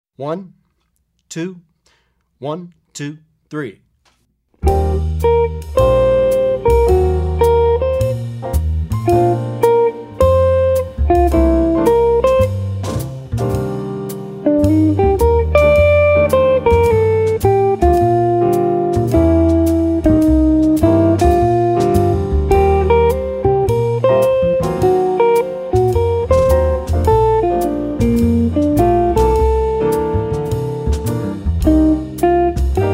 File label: With Soloist